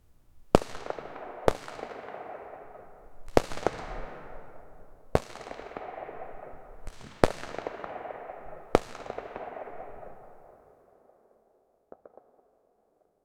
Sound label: a series of rapid consecu, explosives